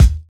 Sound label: original kickdrum sample d sharp key, kickdrum sample tuned to the D# note